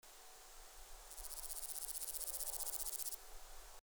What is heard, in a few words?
Stenobothrus stigmaticus, Wienerwald